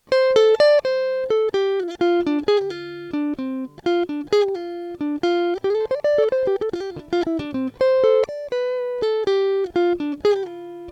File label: rock